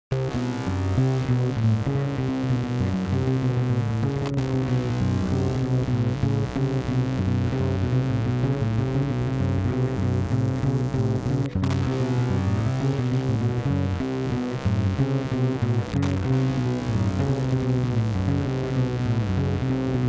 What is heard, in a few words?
Contemporary study #1 for fretless bass guitar; 60x60; 2011 Canada Mix; Philippe-Aubert Gauthier